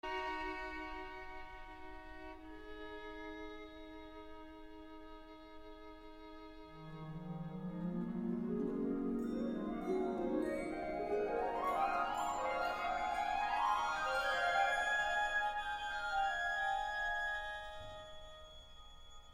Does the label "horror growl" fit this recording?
No